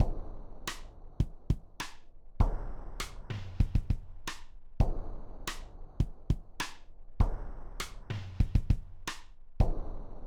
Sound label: Drums; Hylics